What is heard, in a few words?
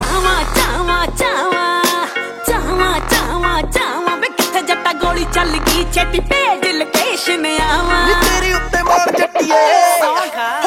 Punjabi Mix Beats